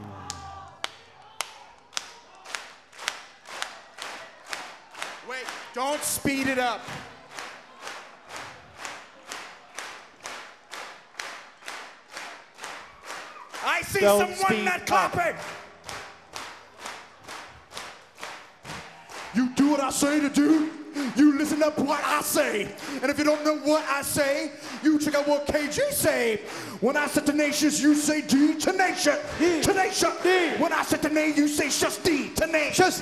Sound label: Chant, Live